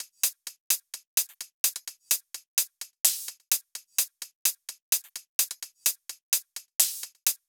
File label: 128BPM Drums; Vengeance Samples; Economic Kit